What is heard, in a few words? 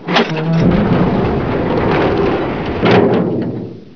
garage door